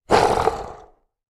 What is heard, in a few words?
hiss; Smite; Sobek